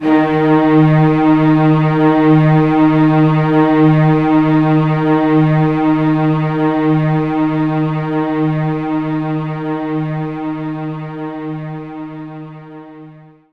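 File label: String Loop D sharp 2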